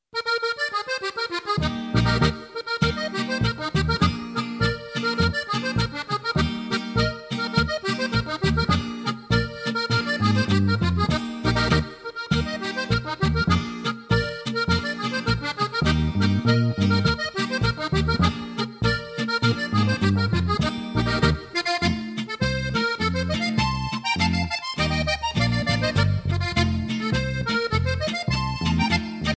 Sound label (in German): Akkordeonspielereien Boarischer